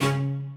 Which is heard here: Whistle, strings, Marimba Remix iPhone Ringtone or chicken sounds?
strings